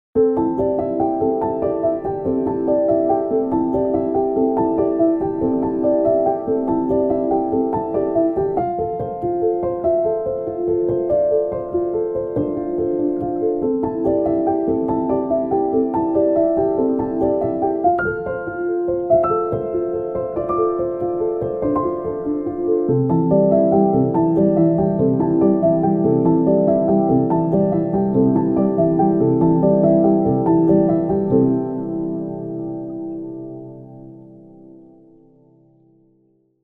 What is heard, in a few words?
Piano Sketch